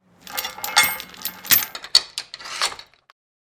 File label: Bicycle chain lock locking